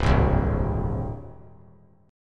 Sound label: C Suspended 2nd Sharp 5th, Csus2#5 chord; strummed; JGuitar